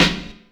SP1200 snare

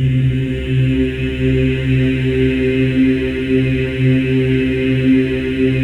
C3, Symphony of Voices men; MEN EES OCT

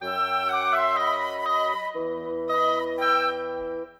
Winds, Orchestral; Sonic Foundry (Sony Creative Software); Orchestral VOL-2; Modern 35

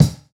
Kick, jungle breaks drum sounds

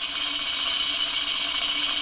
ETHNIC PERC; Roland LA

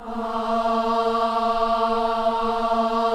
KIDS CHOIR, Gospel; Spectrasonics; Vocal Planet

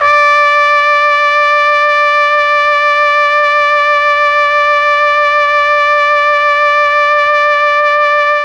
D3 trumpet, Mellotron; CHMB TRUMPET; Mike Pinder - Mellotron Archive CD

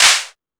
Techno, EMU XL-7

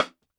DUB RIM, DUB SNARES; Drumdrops In Dub VOL-1; SINGLE HITS

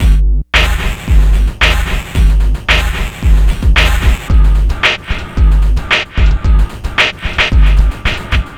Loop; Sonic Foundry (Sony Creative Software); Esoterik Beatz; Warsaw

